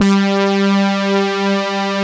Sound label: Prophet VS BRASS G2; Greytsounds VOL-1